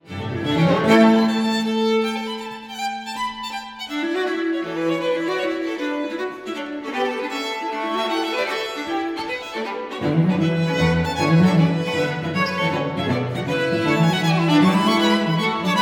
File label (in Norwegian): Udelt takt